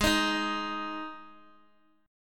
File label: G sharp 5th G sharp, Guitar; strummed; JGuitar